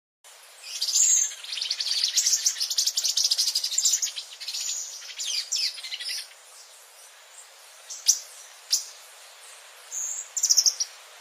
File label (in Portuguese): Canto do catirumbava